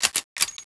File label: scout bolt, weapons; cstrike